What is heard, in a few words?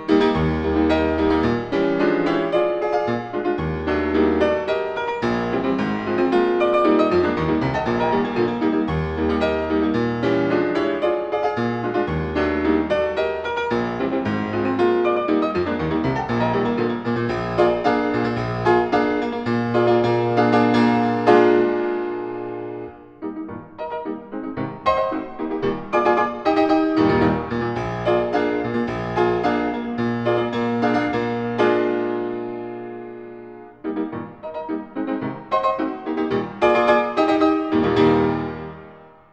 Variations and fugue for piano in E flat major